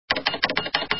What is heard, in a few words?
Typewriter; Hello Typewriter